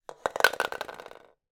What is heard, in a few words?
toy plastic skittles knock over